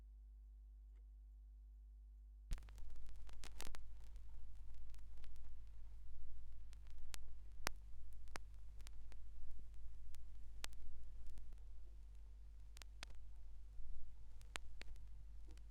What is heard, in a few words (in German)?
schallplattengeraeusche